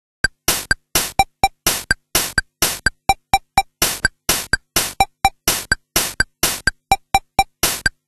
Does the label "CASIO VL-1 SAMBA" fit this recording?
Yes